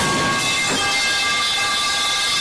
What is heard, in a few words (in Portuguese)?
cano de metal caindo